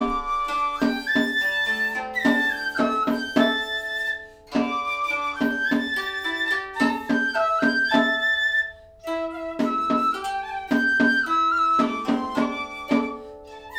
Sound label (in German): traditionelle japanische Musik